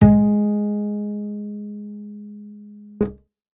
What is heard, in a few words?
bass acoustic